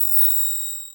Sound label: Production Element Title Transition Crystal Element